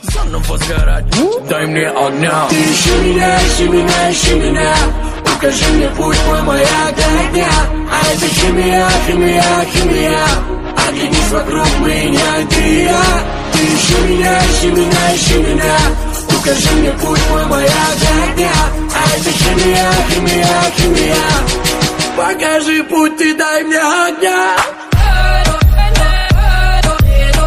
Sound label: remix english music ringtone